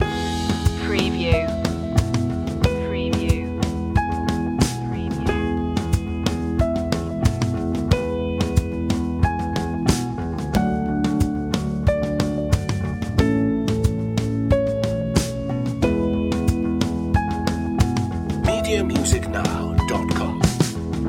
rumble in the jungle loop

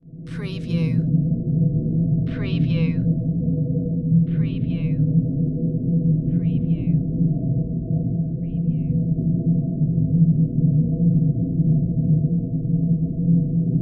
Drone Ethereal; Sound Effects Bible

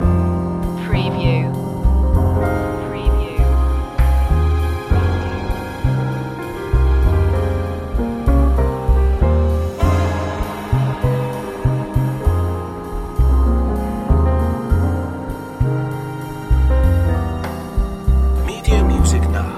dark loop

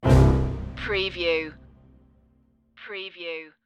stalker stinger

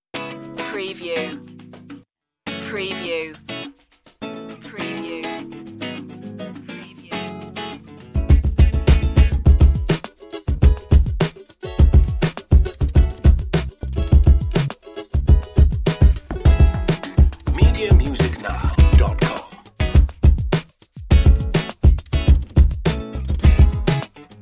samba hip hop

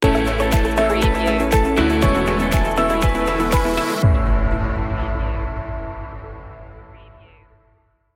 positive flow stinger

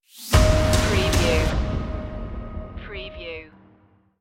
dark power stinger

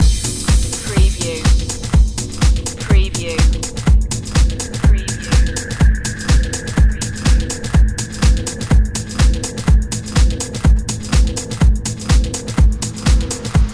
systemic beats loop

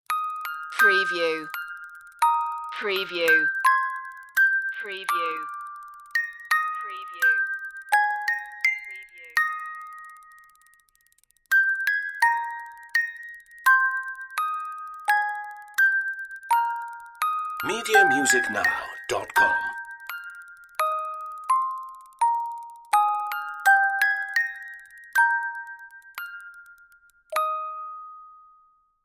musicbox; it came upon a midnight clear

